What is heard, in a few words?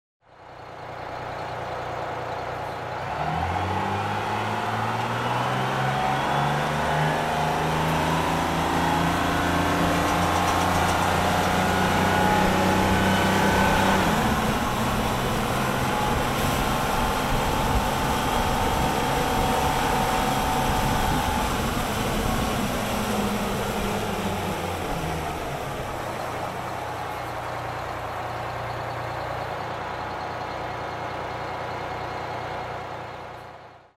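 bus driving sound; Автобус закрывает и открывает двери, езда в автобусе, шум двигателя Общественный транспорт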